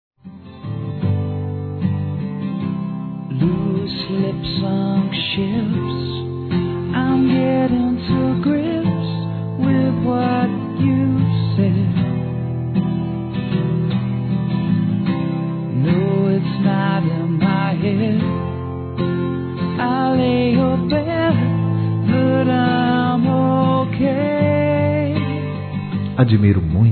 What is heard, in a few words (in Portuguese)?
Admiro muito você, Conquistas Cantadas, masc; Telemensagens 2017